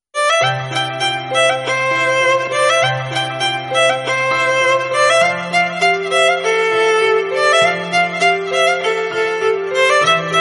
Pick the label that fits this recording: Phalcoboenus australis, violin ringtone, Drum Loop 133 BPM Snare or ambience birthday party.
violin ringtone